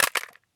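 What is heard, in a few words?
weapon mag drop plastic; Escape from Tarkov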